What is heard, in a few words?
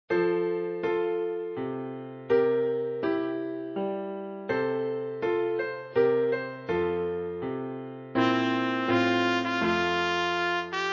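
trumpet, für Trompeteund Klavier; O Tannenbaum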